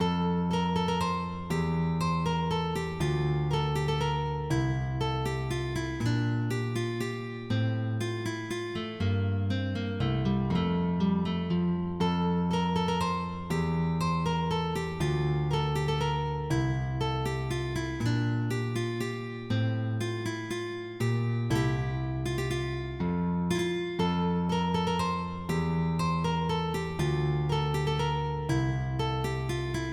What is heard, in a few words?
Menuet, MIDI